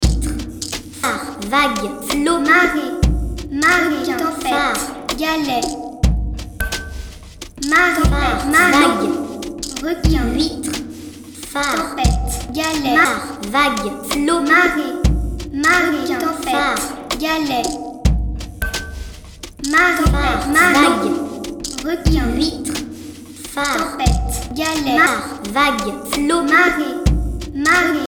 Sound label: matrix groove